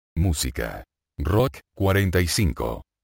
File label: rock